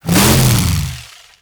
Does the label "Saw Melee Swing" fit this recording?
Yes